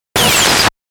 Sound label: fast attack; Fast Attack Shine Hiss Blip; Warped; Blastwave